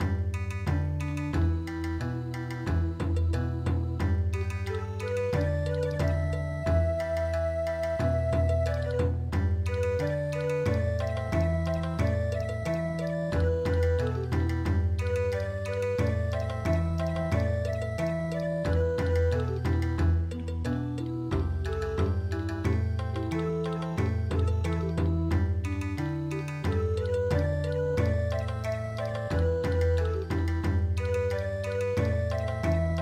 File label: yagibushi Bon Odori